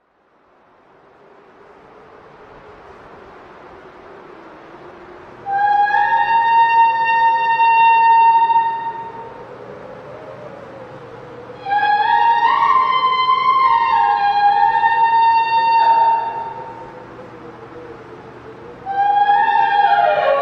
singing, Traditional Scandinavian Herding Call, kulning; winter sound effects